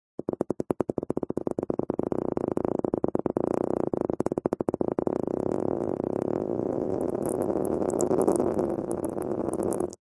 nature water drips fast light into hard carpet

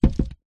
stone large set down thud; foley

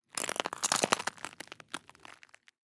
ICE Thin Crushed Crust by Foot

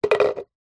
foley plastic tub small down on hard ground; zapsplat

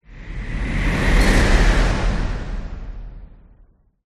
whoosh; orlando lanzini

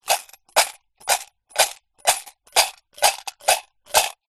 foley money coins in metal tin shake; zapsplat

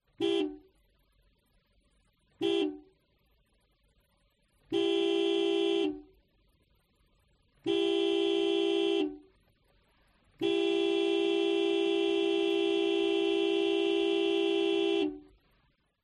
Auto Honda Crv 2.0; audio hero

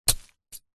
warfare knife stab into soil dirt single

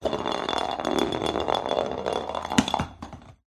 glass bottle rolling fast on wooden floor; Sound Ex Machina

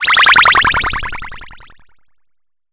dangerous beam; sound design

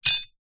knife set down concrete

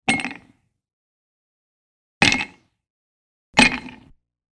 food drink ice cubes drop into empty glass; sound effects one

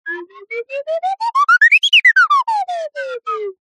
cartoon slide whistle ascend then descend stutter

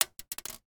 stick insect egg drop in plastic tank; animals

